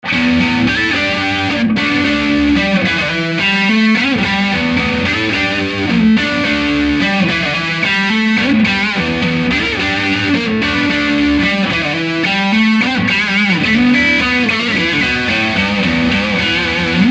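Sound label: neck, guitar pickups; schwarzbach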